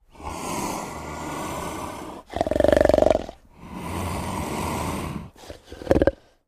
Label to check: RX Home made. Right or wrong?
Wrong